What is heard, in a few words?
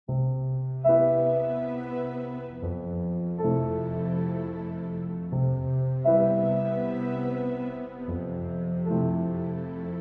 With Strings